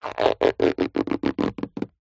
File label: foley rubber stretch; zapsplat